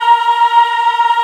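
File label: A#4 men women ah, Choir; USB Soundscan vol.28; Acoustic & Synth; AKAI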